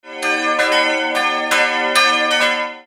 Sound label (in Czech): Zvony